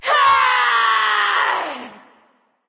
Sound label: battlecry; smite